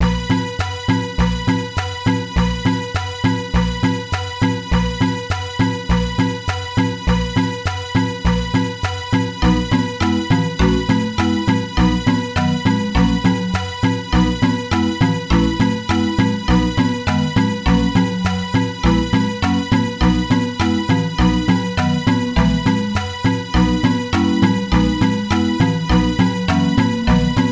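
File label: background music level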